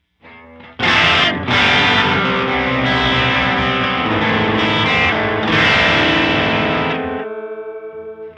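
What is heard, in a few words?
Envelop filter attack 65ms release 500; H910; sensitivity 20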